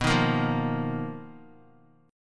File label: B Major 7th Flat 5th B Guitar; strummed; x,2,3,3,5,x; 4F,4A,5C,5G,5C,6E; JGuitar